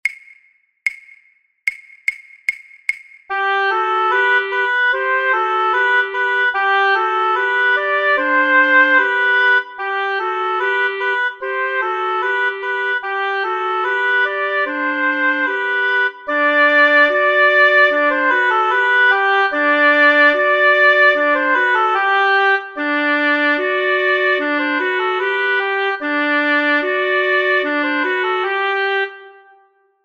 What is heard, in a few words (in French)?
lent sans claves; Gavot